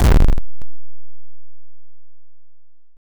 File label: enemy die; baconthulhu